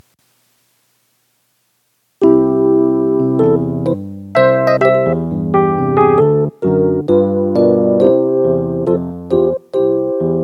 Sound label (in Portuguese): playback hino